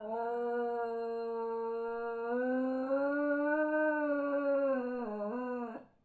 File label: K9 Pain Howl; K9 MEDIC Instructors Sound Board